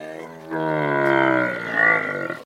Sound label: Camel Guttural Moan; camels